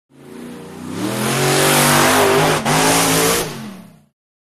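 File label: Monster Trucks Revving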